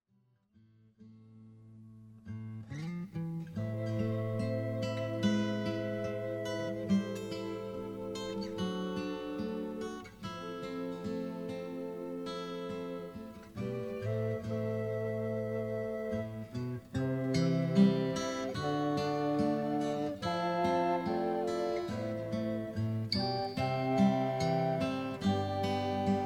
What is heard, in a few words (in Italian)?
flauto